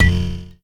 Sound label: Widget Impact; moul